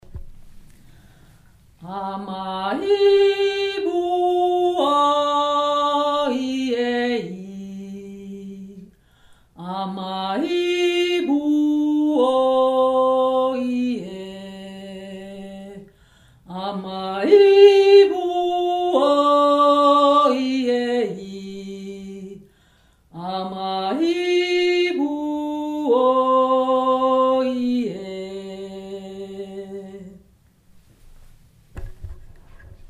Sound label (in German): Melodie langsam